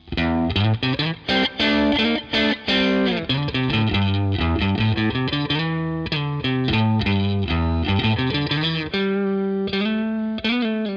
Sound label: tone; wima